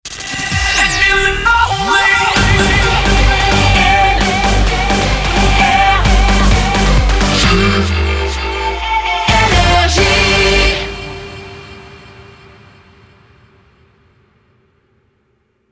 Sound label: jingle; NRJ France; 130bpm